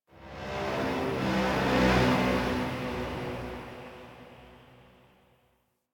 Ship Metal Moan Texture Large, Ambience; Warframe; Corpus Ship Remaster

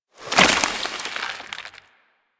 Inf Sniper Rifle Reload, Infested; Warframe

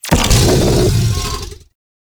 Sentient Condrix Damage; Squad Link

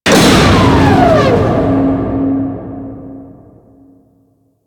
Ocean Turbine Shutdown; Ambience; Warframe; Grineer Ocean

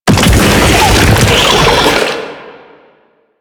Octopede Unearth Dig; Warframe; Man In The Wall

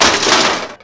Metal Damage, Impacts; Warframe

